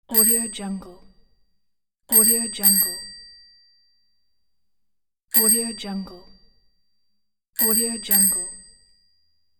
bike bell; sviridpavlov

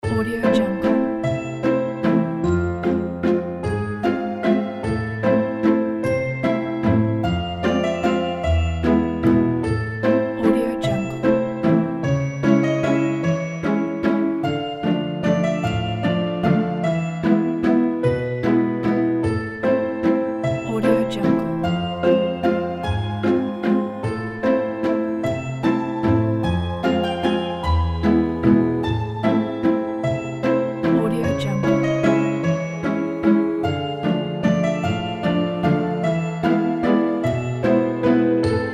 mysterious

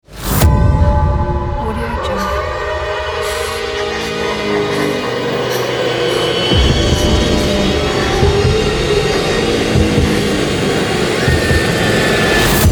horror logo

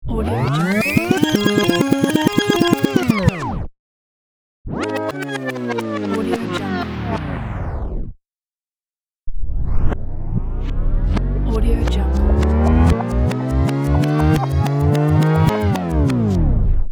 music rewind

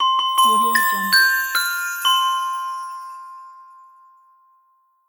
christmas bells

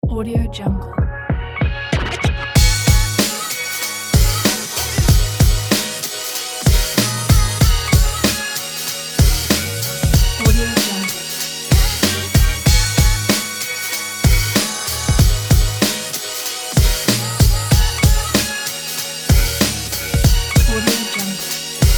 the hiphop

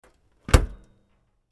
pull refrigerator handle close

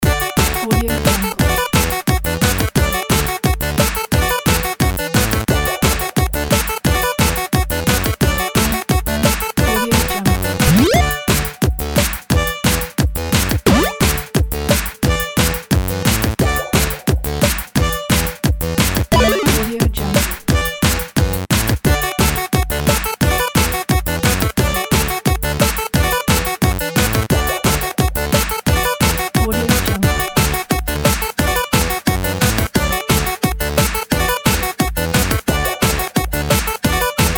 8 bit game loop

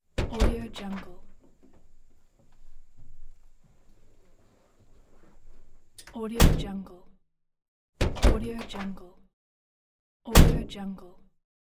opening closing old pickup truck door; applehillstudios

